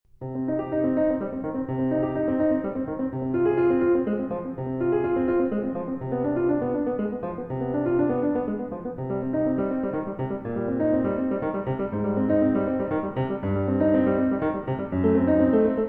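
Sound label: Prelude in C Minor, piano; BWV 999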